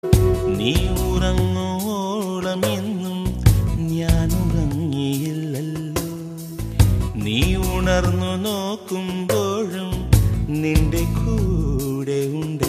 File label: romantic ringtone